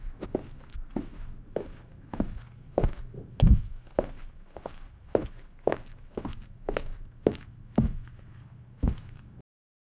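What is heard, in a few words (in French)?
bruit de pas jardin, garden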